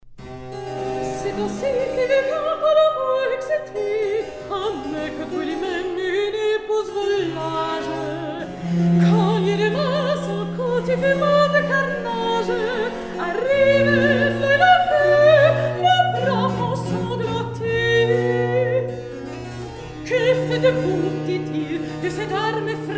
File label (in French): Recitatif; Forges des Lemnos